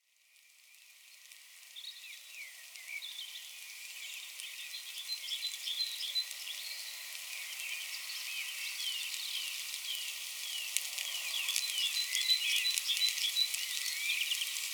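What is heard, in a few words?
light rain in the forest; melkii dozhdik v lesu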